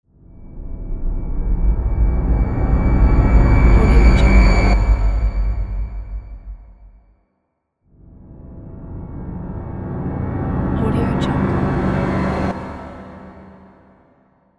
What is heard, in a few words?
cinematic tension builder; n-audio